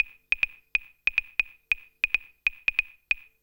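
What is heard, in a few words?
PERCUSSION ELECTRO 140, Techno Drum; Techno2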